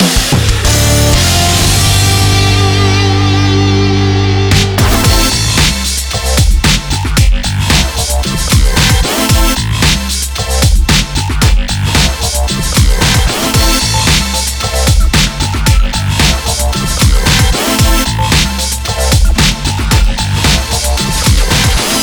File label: level end; Mayhem Brawler; PS4 PS5 Switch Windows Xbox One Xbox Series XS gamerip 2021